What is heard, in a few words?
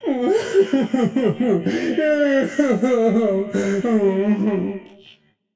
boo hoo; smite; arthur